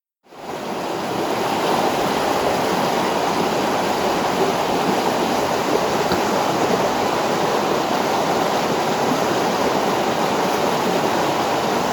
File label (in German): Rauschen Melchaa